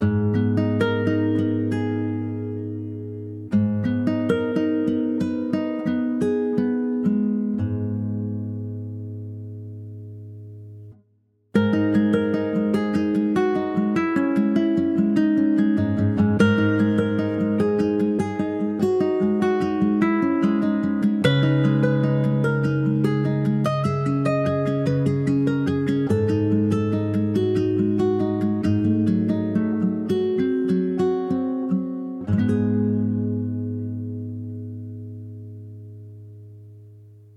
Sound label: Guitar Song